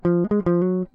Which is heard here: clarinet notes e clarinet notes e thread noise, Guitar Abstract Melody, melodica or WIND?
Guitar Abstract Melody